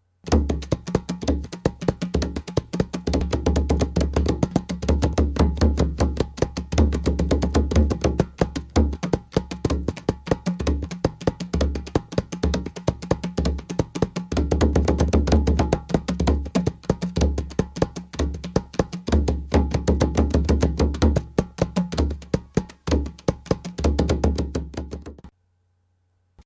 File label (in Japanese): 低音太鼓パターン（太鼓合奏曲チヤンダ）; africa